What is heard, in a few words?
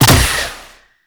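Fire, Hunting Bow; Paris Redux; Weapons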